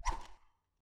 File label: Knife Swing; Scrap Weapons; Zomboid